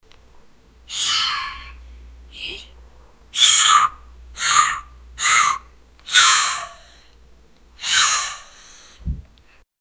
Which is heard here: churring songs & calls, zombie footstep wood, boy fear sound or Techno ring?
boy fear sound